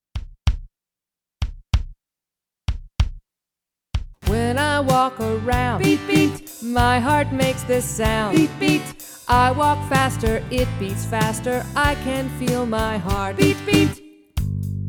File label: Heartbeat beat; Color Me Healthy